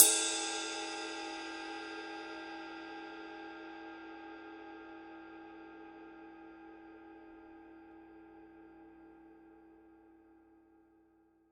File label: 22 power ride body, paiste 2002